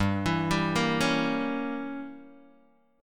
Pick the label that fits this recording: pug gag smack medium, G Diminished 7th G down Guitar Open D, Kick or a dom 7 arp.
G Diminished 7th G down Guitar Open D